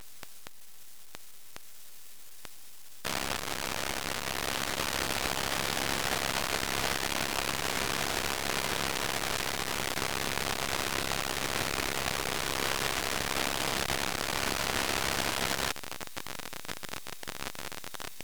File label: noaa194, satellite